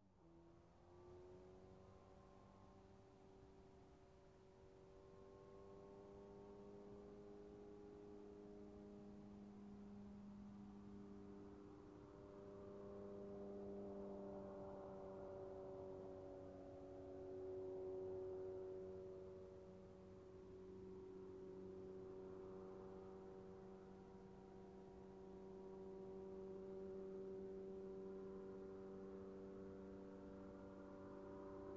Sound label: Motorcycle approaching, turning off